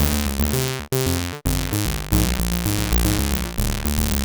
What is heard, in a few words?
synth arp; Electrocution